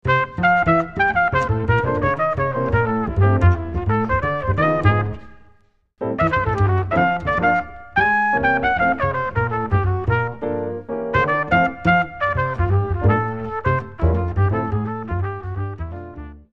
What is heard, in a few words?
minus drums; count off; melody [1 chorus]; piano solo [1 chorus]; trumpet solo [1 chorus]; bass solo [1 chorus]; head out [1 chorus]